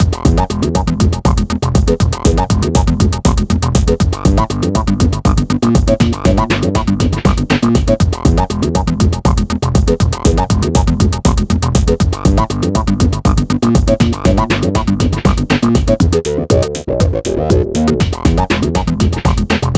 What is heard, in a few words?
Beat; yager windows xbox gamerip 2003